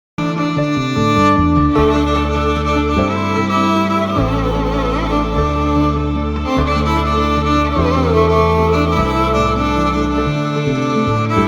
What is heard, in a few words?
Saranam Ayyappa Swamy Saranam violin